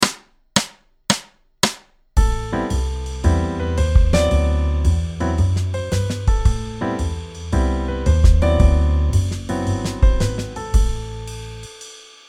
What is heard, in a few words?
Jazz ii V example, piano